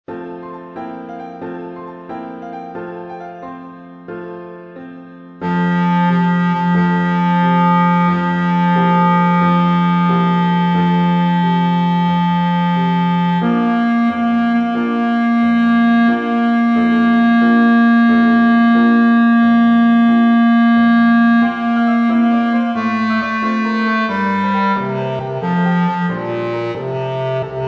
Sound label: Bridegroom And Bride Opening Bass; gondoliers